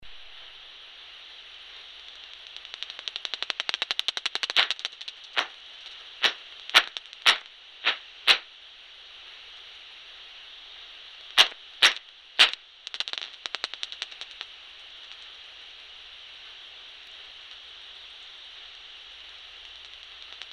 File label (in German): Balzrufe Jagdrufe